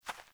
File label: Beaver Dig, Foley; Animals; Ambient